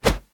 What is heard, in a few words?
whoosh low; Smite; Cerberus